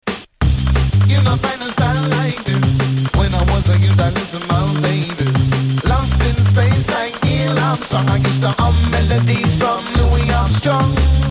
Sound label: vertu tone, sms; ringtones